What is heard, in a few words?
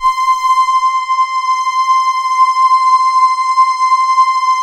CRUMAR; Masterbits; Vintage Voltage